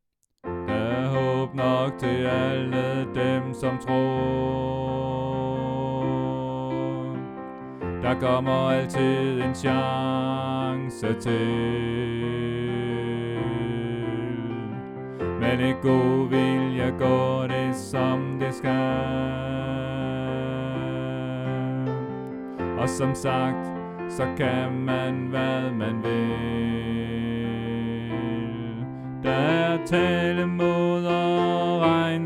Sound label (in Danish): Kom og se far danser Alt; oevefiler